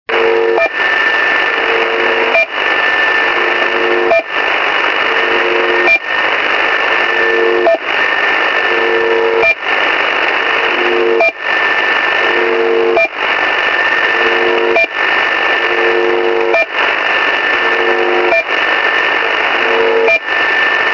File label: channel marker buzzing